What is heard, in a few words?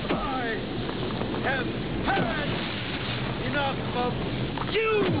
kick